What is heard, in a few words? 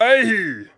clown hit; Ringling Bros. and Barnum & Bailey; Wii